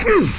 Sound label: grunt; kingpin